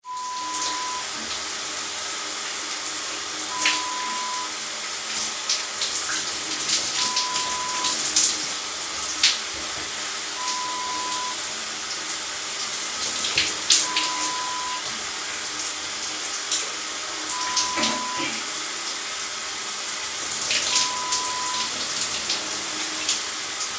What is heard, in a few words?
Bathroom Shower sound